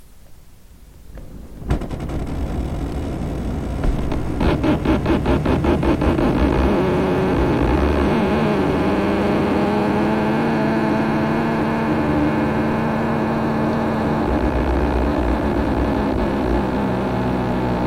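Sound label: iceberg creak, tidal movements; iceberg creak - tindal movements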